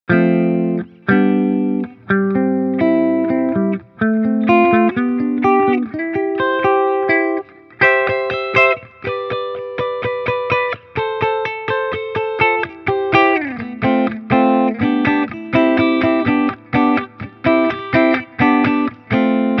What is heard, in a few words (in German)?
Dreiklänge Mix